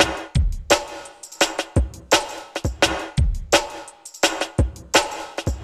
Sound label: Breakbeat, Drums & Percussion